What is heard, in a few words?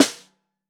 SN Bottom, Snare